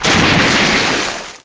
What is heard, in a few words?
dump